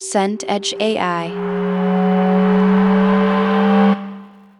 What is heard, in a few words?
riser tonal long